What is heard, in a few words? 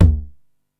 TOM M, 909; musicradar-synth-samples; Classic drum machines; 909TOMS